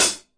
thick hi-hat; DRUMS (SINGLE HITS); Hats

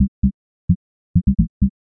130 punch bass, bass loops

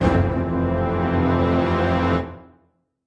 orchestra hit melody kit

